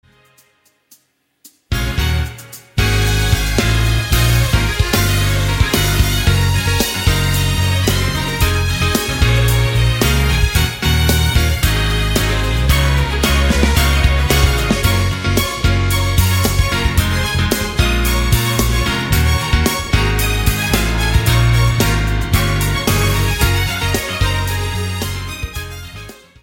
Music Only

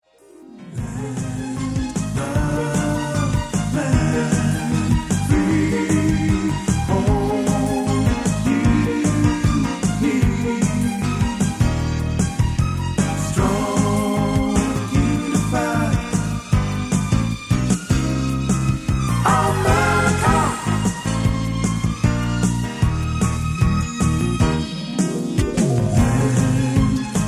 America Instrumental w BGVs

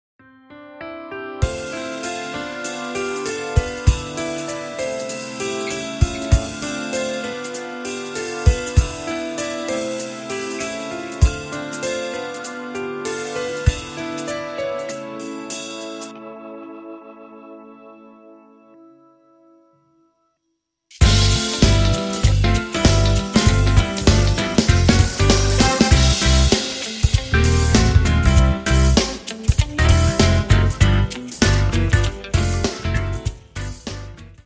Praise the Lord Instrumental No BGVs

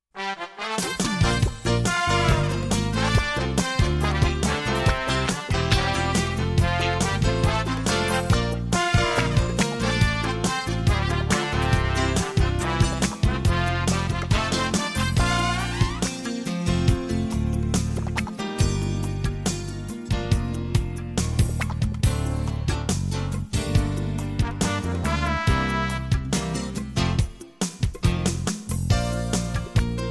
Instrumental No BGVs

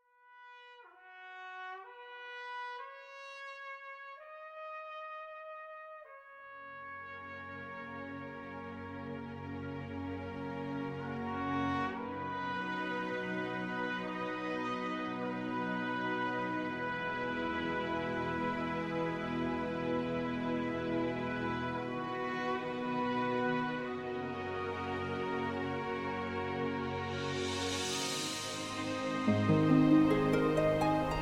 Instrumental with BGVs

